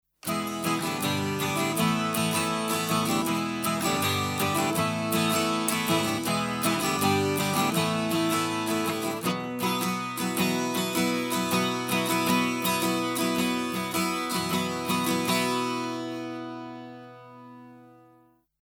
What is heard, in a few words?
Guit 12 String LF Boost 30Hz HF Boost 8kHz Broad; TubeTech PE1C